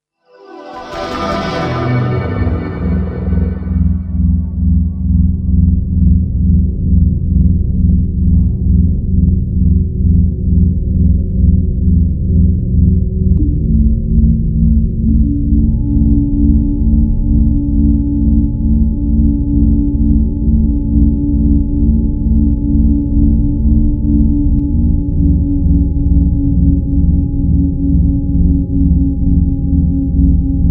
Ambiance; From Dusk Till Dawn

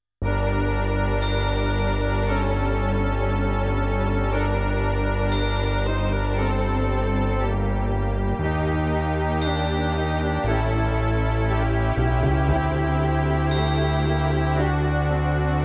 Defeat; hercules glory iv gift of the gods

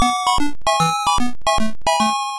Unused Jingle; Megumi Rescue; Master System

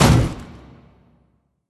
Sarcophagus Opens

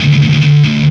Guitar Short Riff

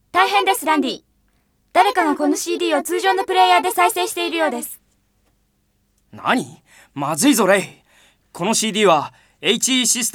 Warning; Nexzr; PC Engine CD

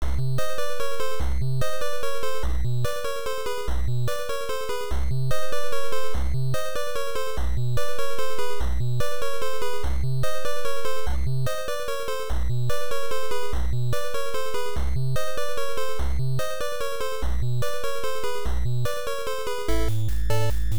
Dungeon; mafat conspiracy

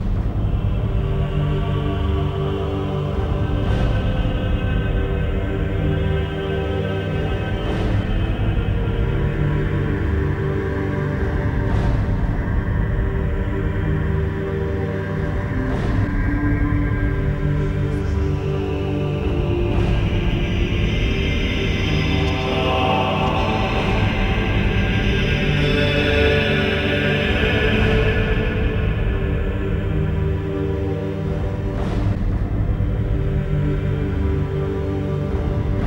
monastery (past) - ambient

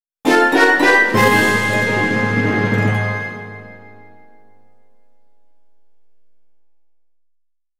Fanfare Got a Valuable Item

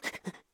effort; Scrat

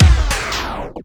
DJ Disco Stop

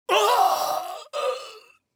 Voices male death grunt; Out of Reach: Treasure Royale

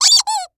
toy rubber lizard squeeze squeak

